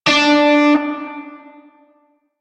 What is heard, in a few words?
Shawzin Oct Three D; Music; Warframe; Narmer Shawzin